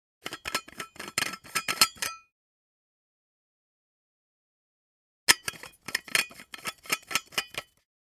Termos otkruchivajut

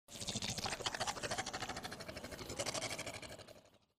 Vampir

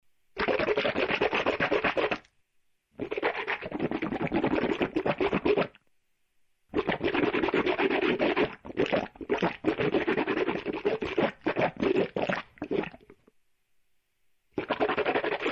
Polost rta poloschut

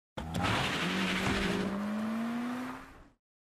Trogaetsya rezko s mesta avtomobil

